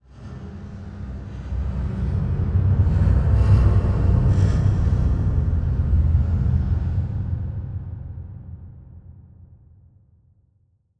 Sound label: Transition Stab Dark Metallic; Production Element; Title Transition; AdobeCC